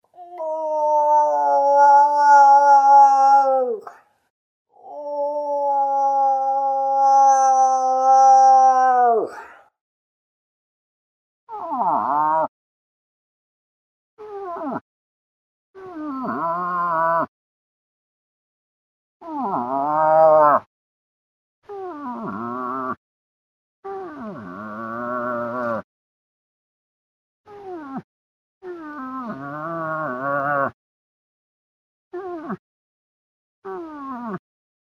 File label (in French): orignaux femelle plaintive